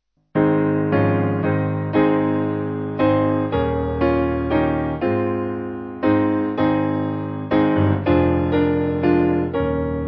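Piano, Free Music Backing Files for Churches - Piano, Band, Pipe Organ; I Am Trusting; Bullinger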